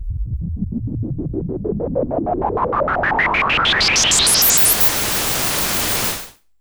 Pulse, Filtered Noise; noise hiss crackle

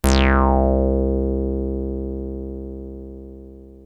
303 C2, TB 303; Hollywood Edge; Giorgio Moroder Rare Synthesizer Collection

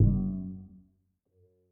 Gargoyle, Yamaha DTXpress-II FX

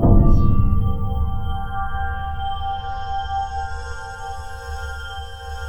ICICLES R; NorthStar - The Wizard VOL-2; DRY ICE

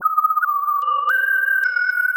Quasar Whistle 110BPM

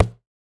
Plastic Tub, household rhythm samples hits

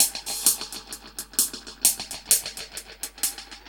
Drums A Hats Echo 130, dub drums; musicradar

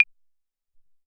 Tek Clap

